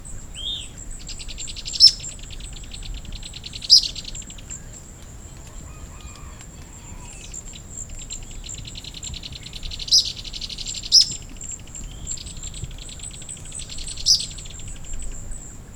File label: phaeomyias murina